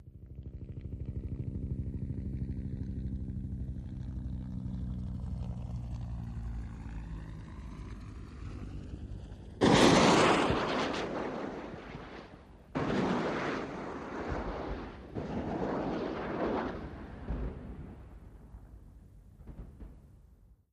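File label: Ah1 Cobra Heli By Medium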